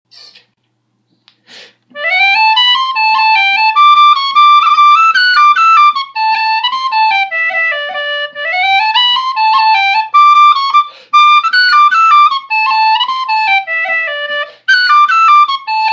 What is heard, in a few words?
polka; tom osullivan's; the last chance; wrens